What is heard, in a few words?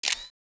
camera sound; BlackBerry OS-10